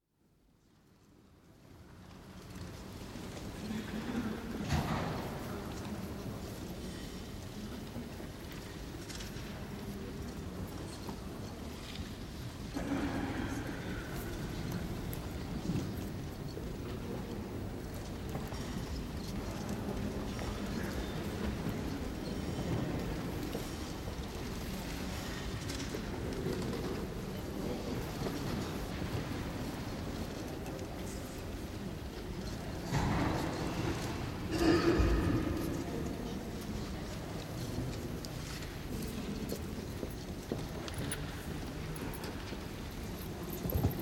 British Library reading room with passing footsteps and voices, book trolley passes at 5'50; Building Sound Effects; BBC